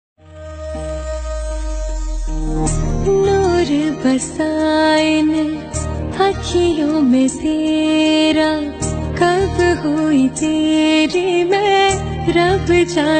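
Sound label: original soundtrack pakistani ringtone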